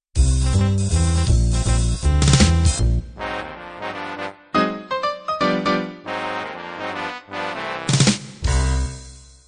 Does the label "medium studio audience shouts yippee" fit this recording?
No